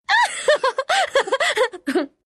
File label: Wind laugh